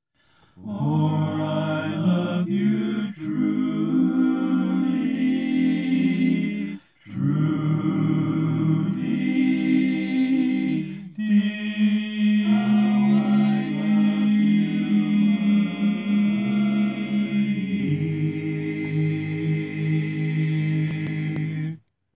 Lead, barbershop tag

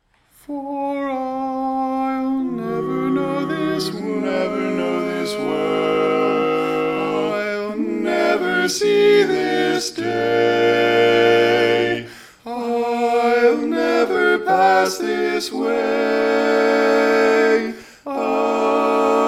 All Parts, barbershop tags

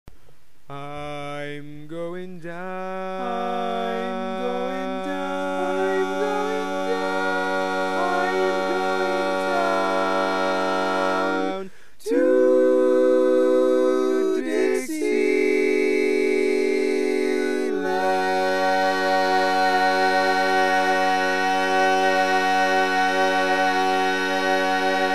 Tenor, barbershop tags

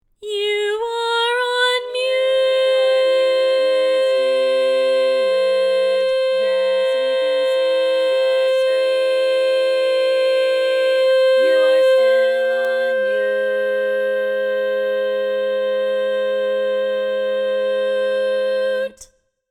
You Are On Mute, Lead, Barbershop

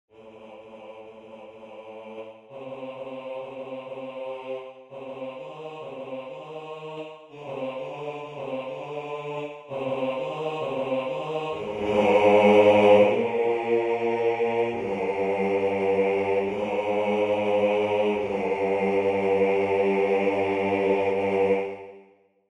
Bari, barbershop tags

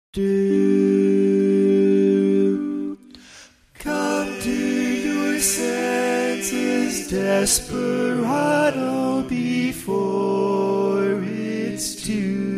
Bari, barbershop tags

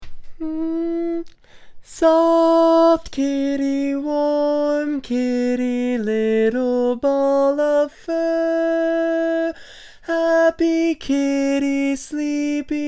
Lead, barbershop tags

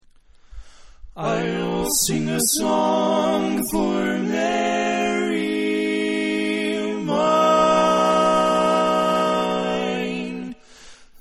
barbershop tags, all parts

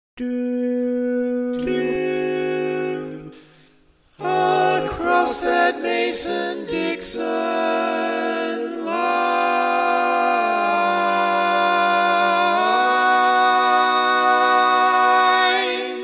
Lead, barbershop

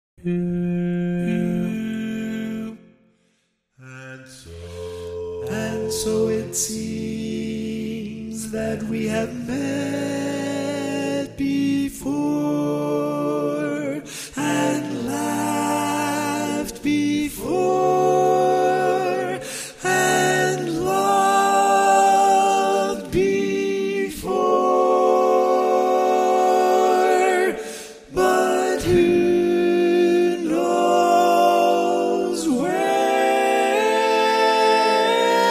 Tenor, barbershop

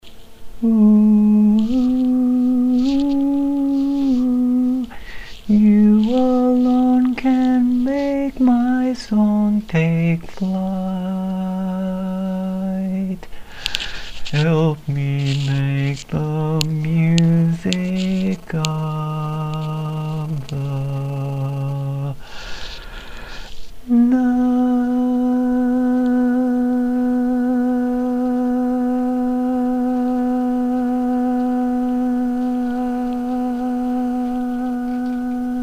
Lead, Barbershop Tags

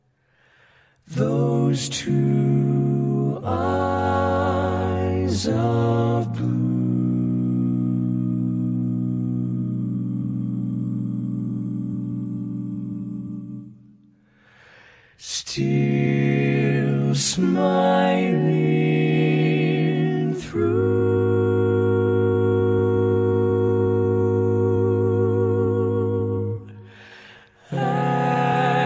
barbershop tags, all parts

